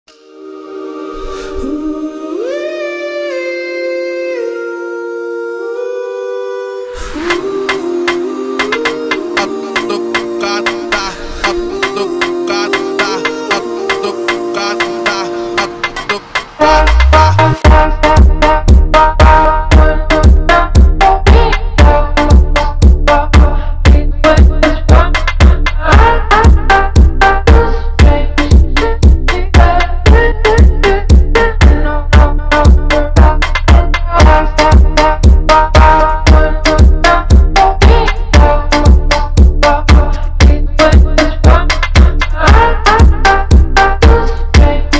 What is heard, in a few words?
Phonk